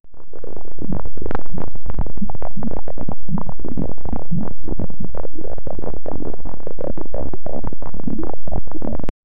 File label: bubble